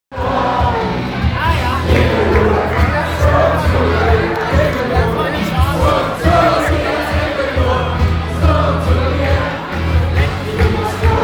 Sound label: octoberfest hall; octoberfest hall gamma; Field Recordings